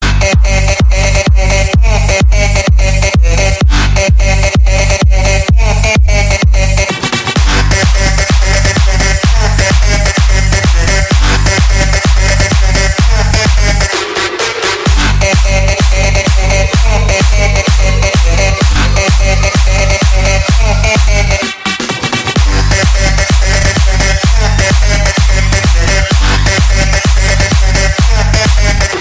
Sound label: new electro dance